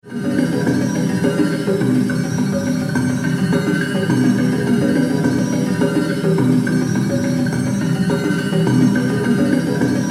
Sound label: SYNTH